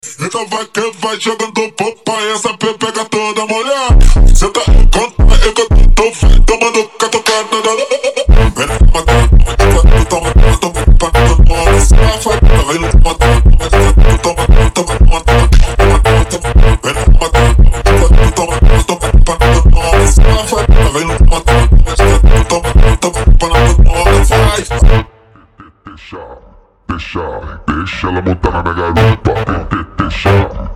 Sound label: Brazilian Fonk